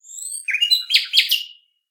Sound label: nightingale